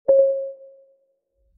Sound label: end notification